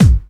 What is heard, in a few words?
prominent kick b key